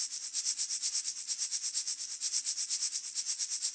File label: Shaker Loop, 128 BPM; Vengeance Samples